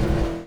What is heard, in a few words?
INT shift up, Vehicle; NASCAR Thunder 2004